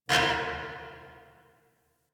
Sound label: Parking Lot Metal Door Distant; Warframe; Ambience; Nineteen Ninety Nine